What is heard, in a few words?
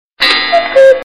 Cuckoo Clock Alarm